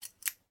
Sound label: office scissors cut air single; sound effects one